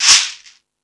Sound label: Percussion